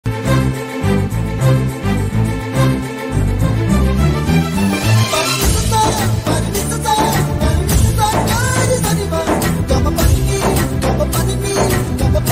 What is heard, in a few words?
lovely bgm ringtone bgm; bommarillu